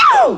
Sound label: Sound PewwPeww